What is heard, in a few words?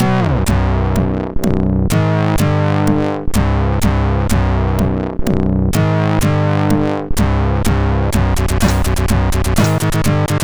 Death Metal, MOS6581R2